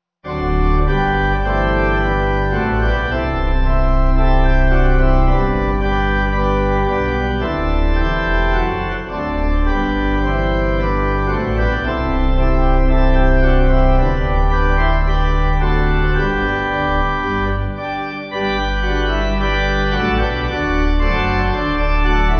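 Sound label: Pipe, Pipe Organ; H. Lillenas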